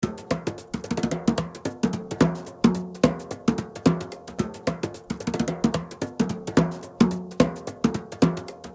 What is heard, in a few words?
110 Frame War Drum